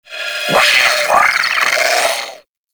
Sentient Attack, Barks; Warframe; Sentients; Brachiolyst

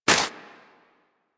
Armadillo Dig Snow; Warframe; Hunting Critters; Foley

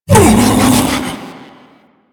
Ape Prelate Pain D; Enemies; Warframe; Proto Infested

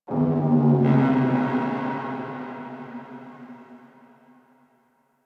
Crp Ship Energy B Texture Large; Ambience; Warframe; Corpus Ship Remaster

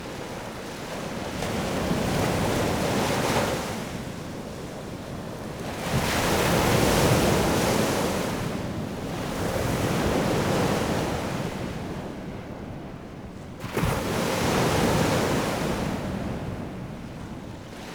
Lake Distant Wave Crashes; Midrath Lake

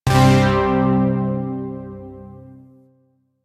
Shawzin Chromatic Chord; Zariman Shawzin

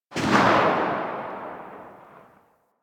Fire Tail; Weapons; Seer; Warframe

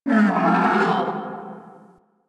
Landscape Cave Creature Calls, Ambience

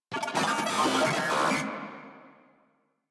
Moa Vocal Pain; Enemies; Warframe

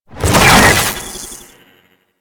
Teleport; Warframe; Captain Vor

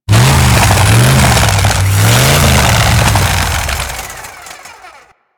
Saw Idle Rev, Melee Weapons; Warframe; Grn Ghoul Saw Player

